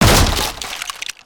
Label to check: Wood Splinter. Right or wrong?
Right